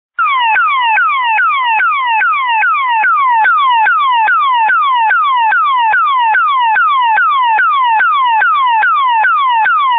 Radio Beacon

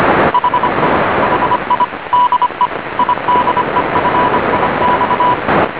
cw; Sonidos del IDEFIX

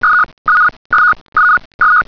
Sound Sample AMTOR ARQ

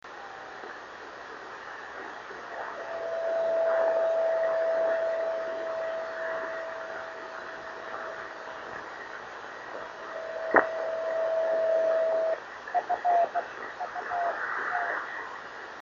26690KHz

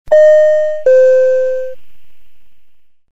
Airplane Ping notification sound

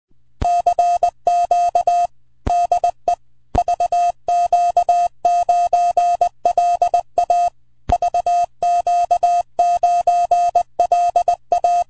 80MTR CW; CX5BW

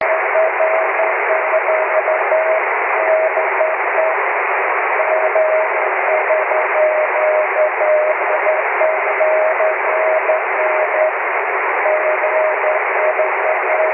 cw

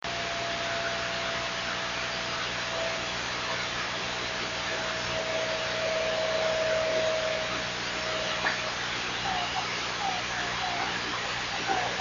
driftnet beacons

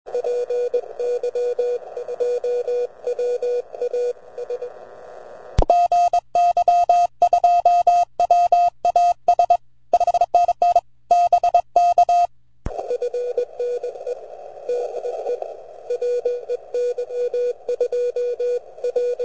80mtr cw; py2was

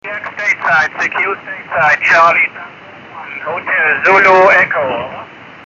50.116 MHz, ham radio; in Portugal, grid square IM57

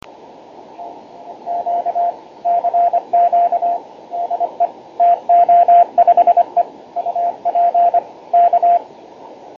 50.103 MHz, ham radio; TO5E on Saint Barthelemy Island, grid square FK87

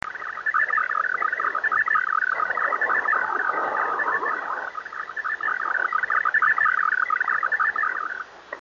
data 26620KHz; unknown type data transmission on 26.620 MHz

